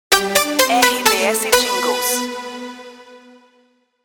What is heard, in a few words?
theme promo ID